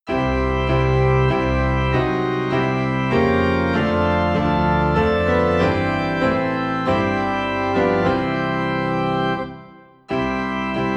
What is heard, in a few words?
Piano and Organ Low in C; O Little Town of Bethlehem; christmas